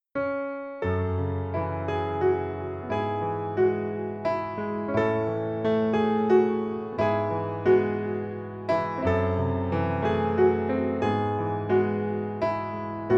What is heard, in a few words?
Away In A Manger Karaoke